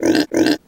pig; Animals

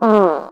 fart short

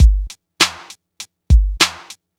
Drum loops Electro

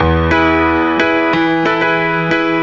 piano, phonky loops

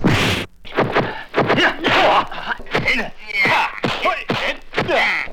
martial arts

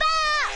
"ah", Voice

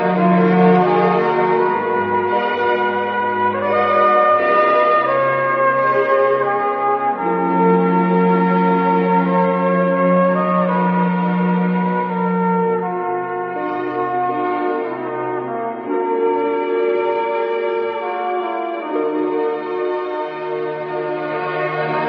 strings and horns bleak, sad loop; loops

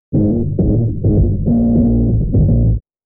bass, Loops Phonky

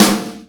heron, snares real

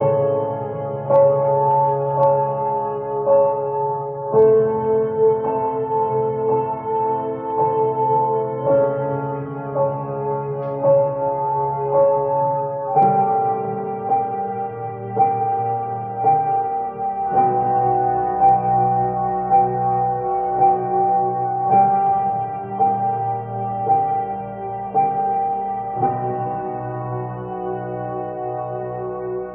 piano tragedy, Sad; Loops

